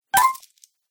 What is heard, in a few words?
Magic Treaure Coin Pick Up, video game